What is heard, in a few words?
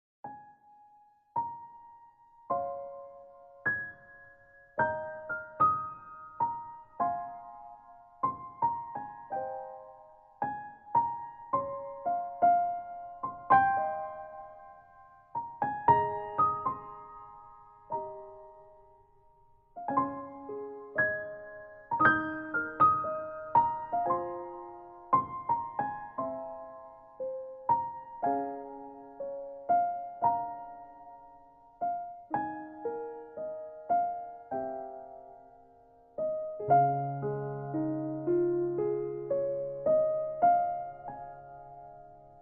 Sad Piano Song